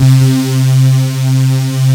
BRUSH SYN, DANCE; Pro-Rec - Synthsonic; Z1 DANCE

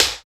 TAP; East West - Phat & Phunky

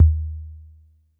F2 - Analog Tom 1; Yamaha PSR-E323; Dance Kit

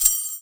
SHAKER, INDIAN PERC

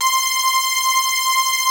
POLY SYNTH; Wizoo - Powered Wave

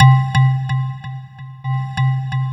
C2, Synth Line; 90 s Sample CDs; NiceBeats; SynthLine VOL-2

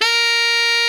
TENOR MF A#4, Saxophones; Greytsounds; Philip Wolfe David Crozier's Saxophones

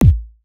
hardbassdrumz, breakcore; Bazzdrumz

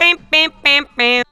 PIPANPAN, Funky Vocals; USB Soundscan vol.18

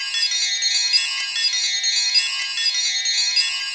SYNT CHIME; AMG - Vince Clarke Lucky Bastard

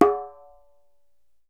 DJEMBE, African Perc

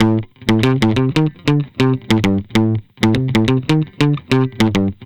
Guitars B, 95bpm, analogue circuit; musicradar

